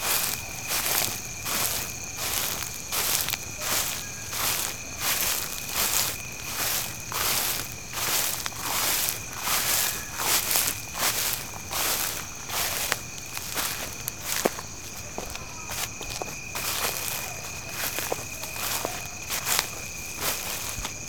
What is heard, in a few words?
Footsteps